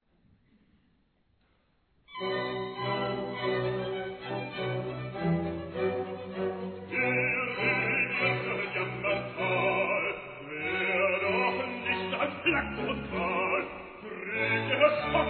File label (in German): musik